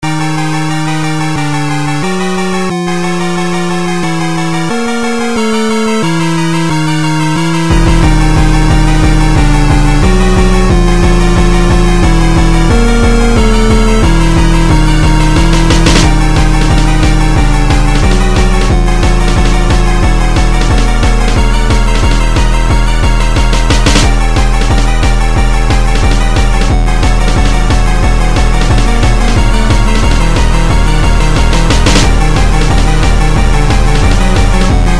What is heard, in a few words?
jumpstyle; mario